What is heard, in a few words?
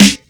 natural steel snare drum g key, steel snare drum tuned to the G note